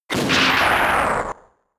Thunder Punch; attack moves; Pokemon; Gen 4; DPPL HG SS

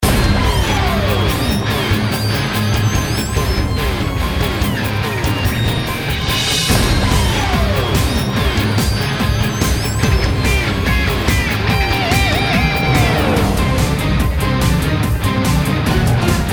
Boss Battle BGM; rusty hearts

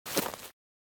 Footsteps, Human, Grass, Run; Backrooms 2D

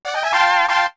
Jingle Bingo

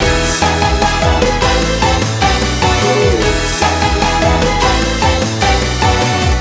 BGM Jingle; Suzumiya Haruhi no Gekidou; Wii

